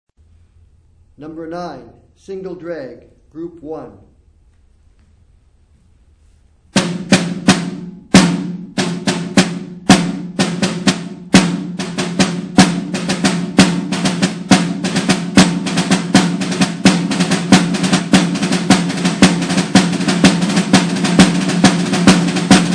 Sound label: snare rudiment